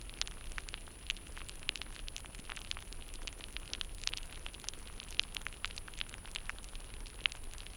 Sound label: Gardening; Soil; Gallus/Frankfurt; Christoph Collenberg